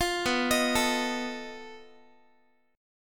B 7th Flat 5th B down Guitar; B7b5; arpeggiated; 20,19,18,19,x,x; 4A,4E,5A,5D,5G#,6C#

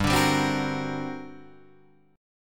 G Minor Major 11th, G, Guitar, Drop D

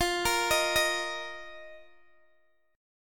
F 7th Suspended 4th F down Guitar DADGAD

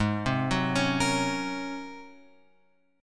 G sharp 11th G sharp; G#11 Chord {4 3 4 6 X 6} arpeggiated

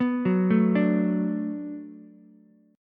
D 6th D down Ukulele, chord; D6 arpeggiated; JGuitar

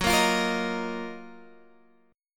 Gb Major 13th; GbM13 strummed

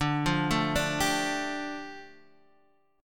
A 7th Suspended 4th Sharp 5th D

